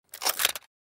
Звук затвора фотоаппарата

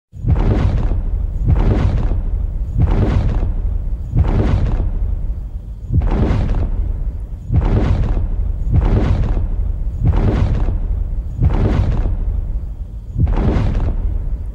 Звук шагов тираннозавр

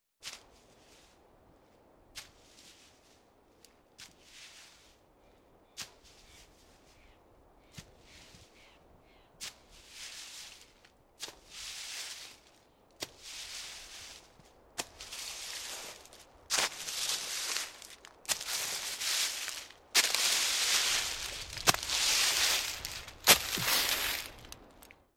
Звук шагов Чудовище подволакивающие ногу

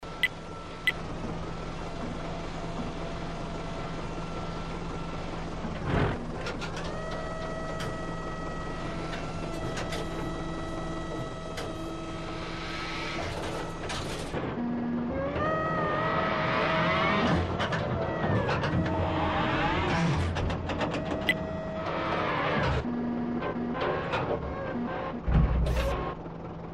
Поворот башни танка внутри Rotate the turret of the tank inside

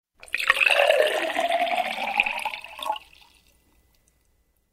Жидкость наливают в стакан

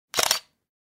Звук затвора фотоаппарата canon

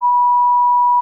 Запикивание мата The sound of censorship; тональность 3

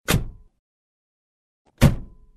Звук закрытия двери у легкового автомобиля

